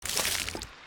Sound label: fail